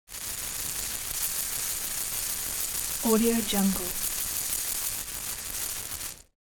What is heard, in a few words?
creature abstract insect shaker rattle soft